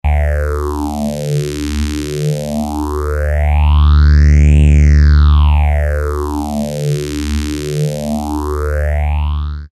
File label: saw; BIG MF103